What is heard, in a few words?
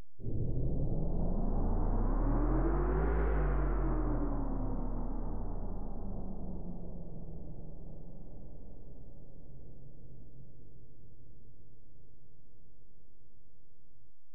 Fx; Alesis DM-PRO